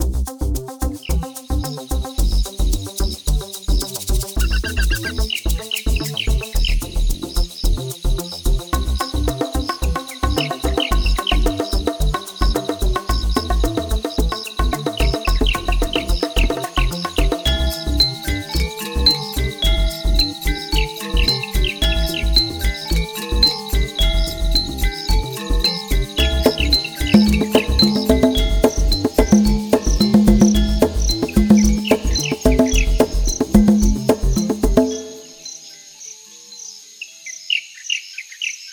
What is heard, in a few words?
Jungle; LeEco; Le-2